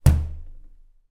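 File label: household door close impact bang wooden; zapsplat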